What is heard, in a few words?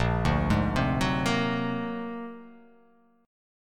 Bb Augmented 9th Bb; Bb+9 arpeggiated; JGuitar